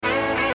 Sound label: musical medium door